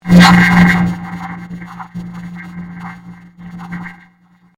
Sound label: Convolution Surge